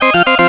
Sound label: Ring, Cisco Unified IP Phone 7945G